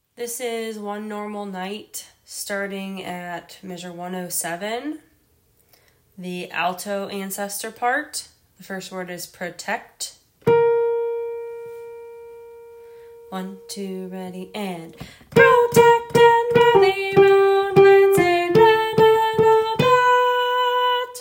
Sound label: one normal night alto